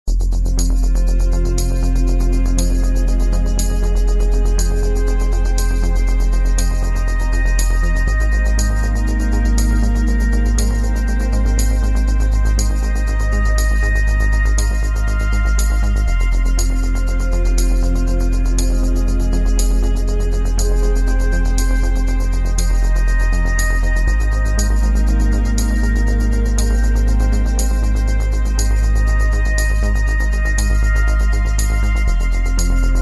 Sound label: PAD LAST DAY